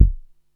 EH32 BD, Electro-Harmonix DRM-32

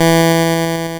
E3, LSDJ Notes; LIMAT LSDJ kit

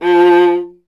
viola F; Miroslav Vitous; Solo Instruments VOL-1

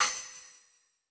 U220 FX Set, Drum Machines; Roland U220

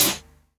HH, HIHAT HIP; Best Service Dance Mega Drums

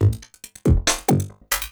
LOOP, 140 BPM, Drum & Bass

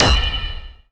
movie, impacts & hits; Techno Trance Essentials

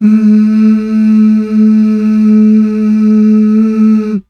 MMHH

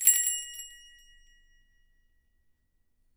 Percussion & Harp; Miroslav Vitous

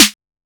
Snare, 606; classic machines

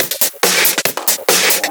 Break Beats, 140 BPM

